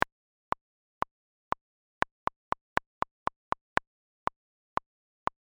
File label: metro